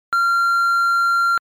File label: Triangle 1380, frequency